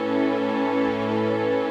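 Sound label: Pad A major, Orchestral; Sonic Foundry (Sony Creative Software); Orchestral VOL-2; Pads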